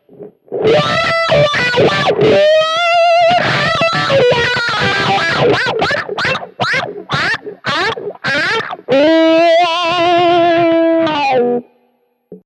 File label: wah